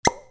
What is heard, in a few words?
KITCHEN